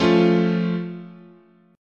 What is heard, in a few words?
F Augmented, chord